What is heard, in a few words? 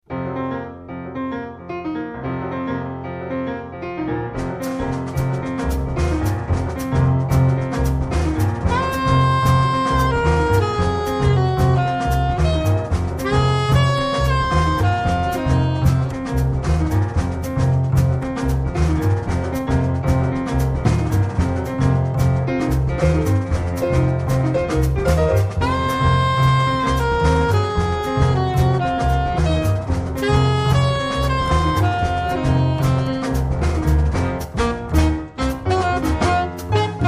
Phrygian Call, jazz instrumental